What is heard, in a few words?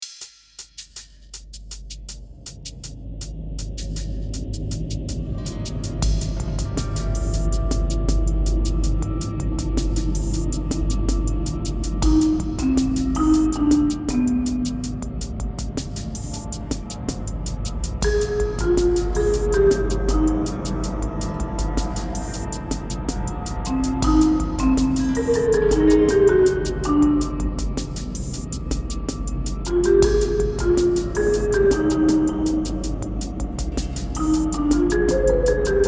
Dungeon Delving; Dungeon of Elements